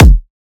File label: Kick, Drums; One-Shots; Kicks; Ghosthack; Color Bass Essentials